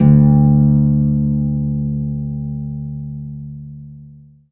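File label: HARP E2 SUS; ILIO; Synclavier Strings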